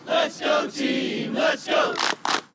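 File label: Ambience Baseball Crowd; Kinect Sports Season Two; Xbox 360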